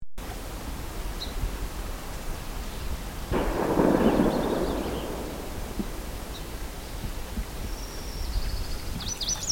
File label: bird and gun ridge rd